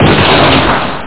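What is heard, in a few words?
artillery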